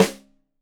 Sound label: Rim Shot Stereo, drums